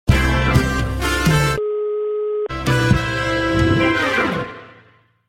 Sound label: Jingle; Debitel